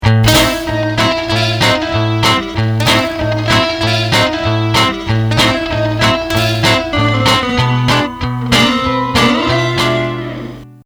swing

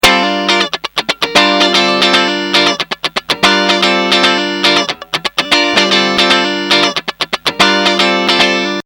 train

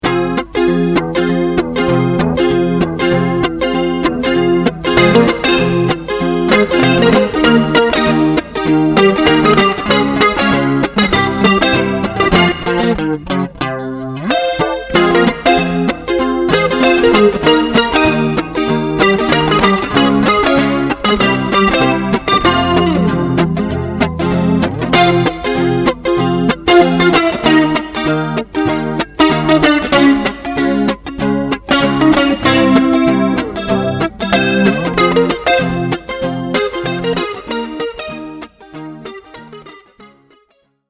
samba

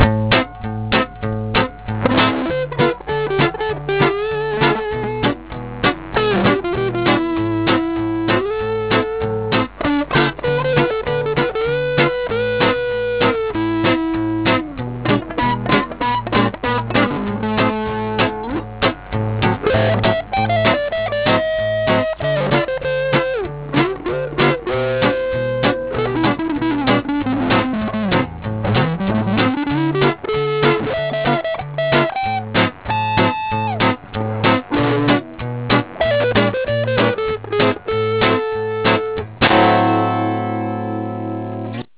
swing

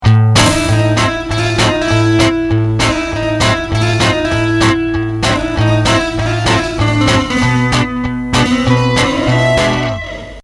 swing

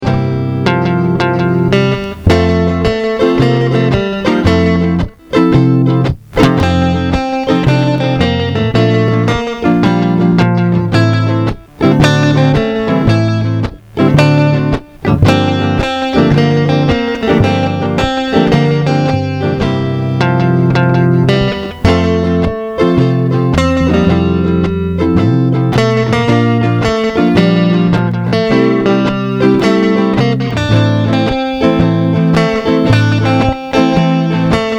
raindrops

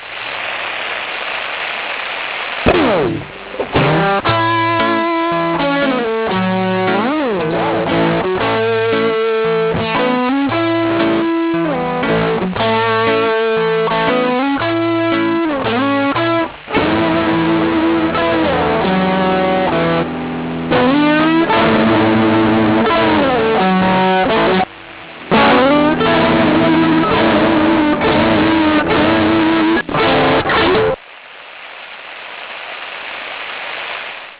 rain

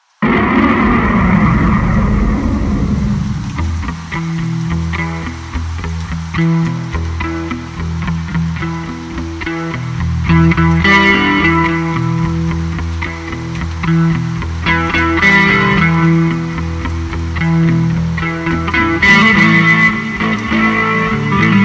storm

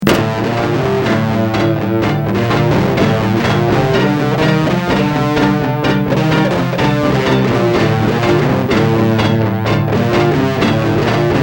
tango

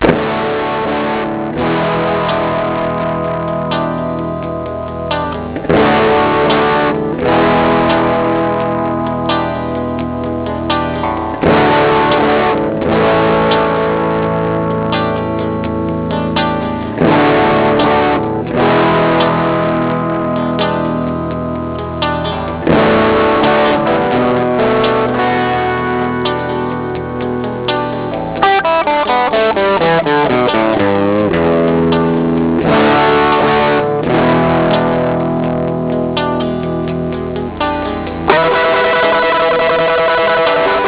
rumble

